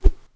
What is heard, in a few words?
Stick